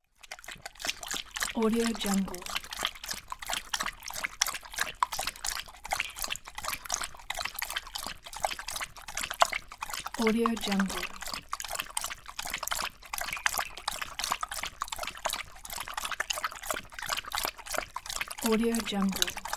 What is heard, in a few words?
dog drinking; shymonsfx